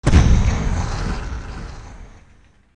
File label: acid, modern weapons; golgotha